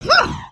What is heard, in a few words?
Run, creatures organic